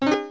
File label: start; rm-507